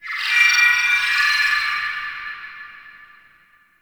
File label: High Tech, Ambience; Guild; Warframe; Misc Remaster